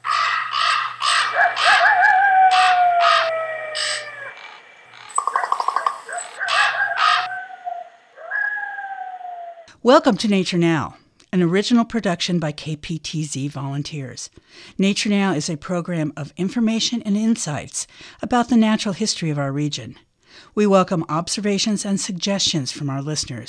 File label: Bird Song and Vultures; NatureNow